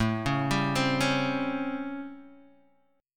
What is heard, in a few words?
A 7th Sharp 9th, Guitar, Open D; 7,4,5,6,4,x